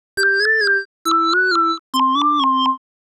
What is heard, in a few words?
136bpm Lines n Loops Synths, uk garage samples